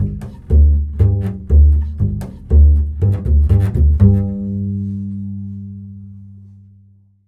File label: Bull Fiddle, Appalachian